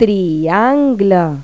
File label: triangul; Pipo-Aprende musica con Pipo